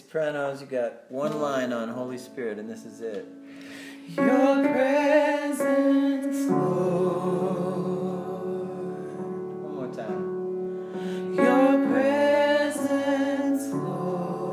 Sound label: Holy Spirit SOPRANO